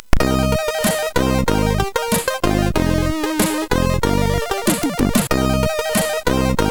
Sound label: Funky, sid MOS6581R4